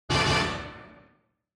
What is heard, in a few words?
Quiz Question Low